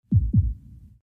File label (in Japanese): 心臓の音